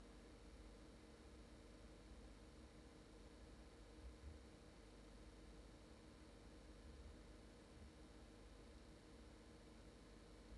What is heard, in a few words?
noise